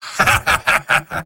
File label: engineer laugh evil; tf2; garrysmod; mvm